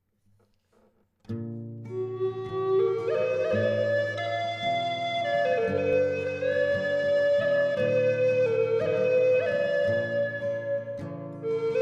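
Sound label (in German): Eastern Red Cedar 6 Loch G mittel 432 Hz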